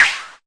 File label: Arturia MiniBrute sn